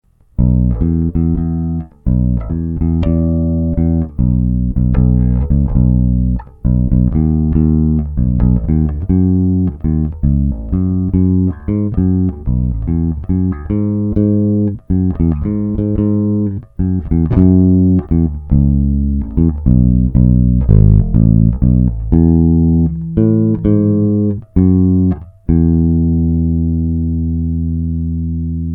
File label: song bass